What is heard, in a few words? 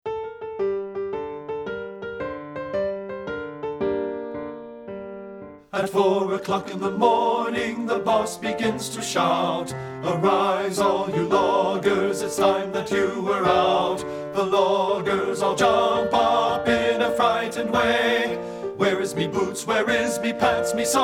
BASS; men